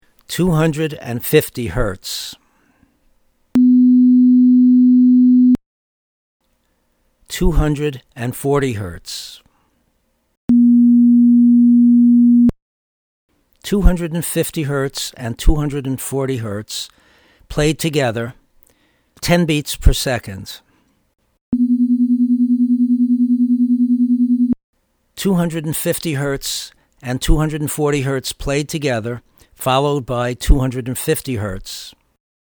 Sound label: Pitch Of Beat Freq